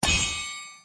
Click Help

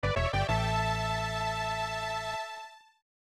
Item Gained; Chou Mahou Tairiku Wozz; SNES

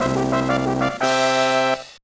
Jingle, gba; Dan Doh Xi

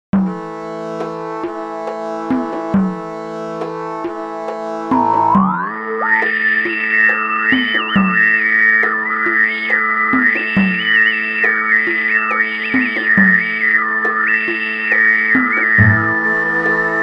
Khoomei Instrumental; animal crossing new horizons original soundtrack 2 2022

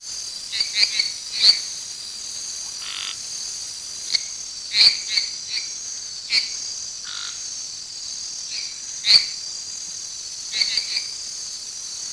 Animals - Jungle Frogs; Scratch

